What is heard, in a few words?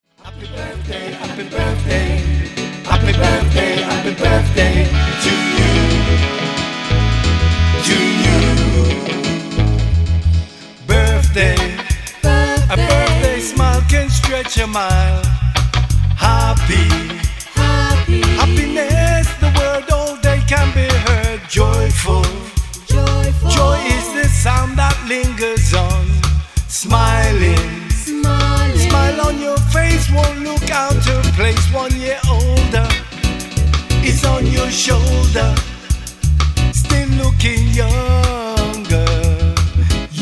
Birthday Vocal Dub